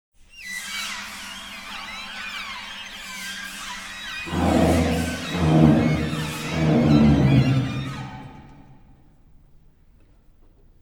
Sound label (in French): gliss oppos registr